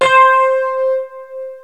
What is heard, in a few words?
RHODES, D - 70; Rubber Chicken Software Sample Bank